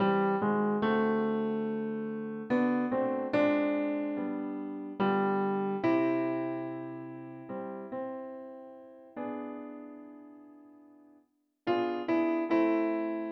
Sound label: SATB tenor predominant